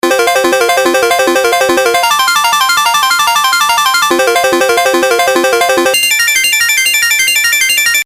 arp mad chords

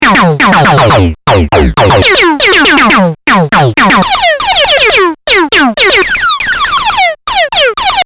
synth tom

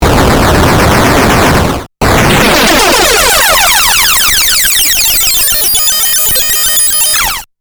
riser

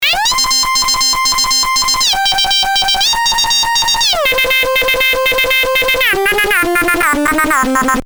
lead dugga duh

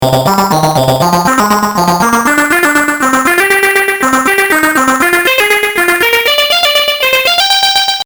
almost analogue

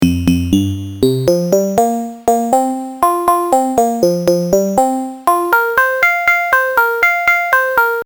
8bit pluck

